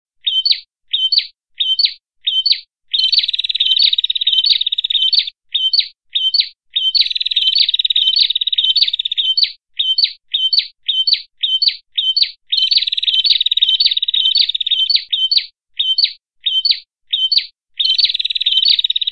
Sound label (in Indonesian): prenjak